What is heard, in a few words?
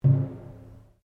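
Drum Taiko Barrel piano, Japanese Taiko Drum; Miscellaneous Musical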